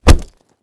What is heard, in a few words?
Impact Hit Knee; Deathcam